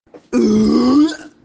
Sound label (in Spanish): vomito